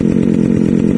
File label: engine; There; ThereClient; bacio